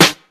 bright snare one shot a sharp key, snare sound tuned to the A# note; snare drum samples